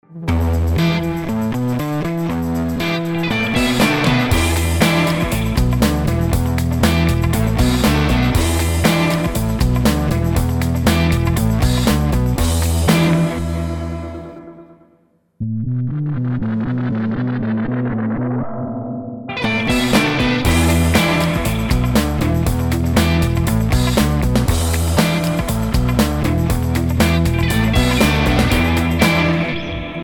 Blues

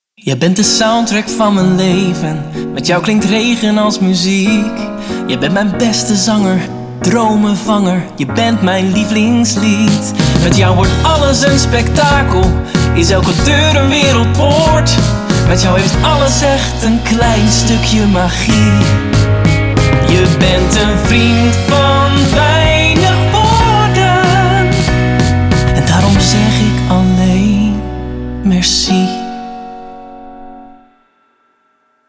Song Dutch Version; Merci

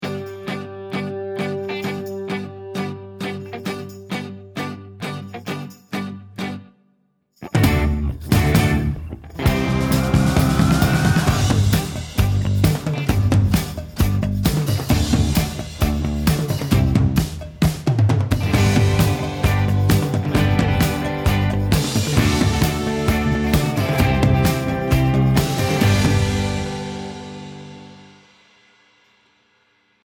music Oh Snap; Vinted; Just Snap Moms

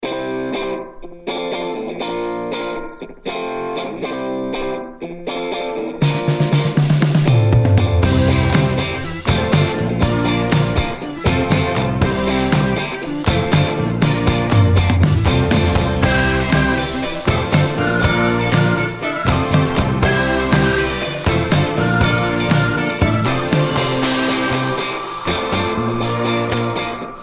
music Summer Stroke; Vinted

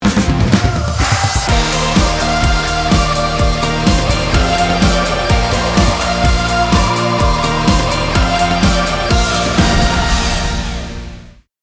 Boxing Brand Music; Bref; Tulb - Bref Boxing

